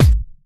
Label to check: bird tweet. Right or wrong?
Wrong